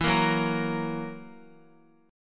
E Suspended 2nd Flat 5th E down Guitar, Esus2b5 chord; strummed; JGuitar